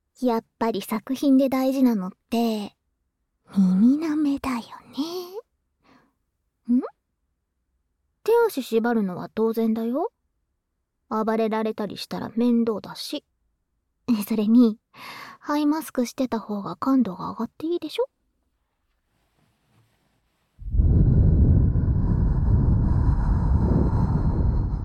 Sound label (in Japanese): 耳舐め, ASMR